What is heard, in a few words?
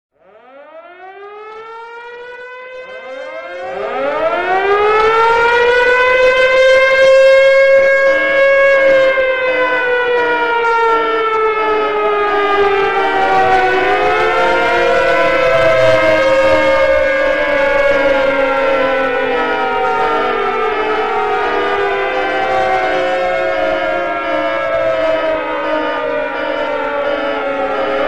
ufo music; zombie plague